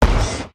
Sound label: giant demoman step; source engine; tf; mvm; video games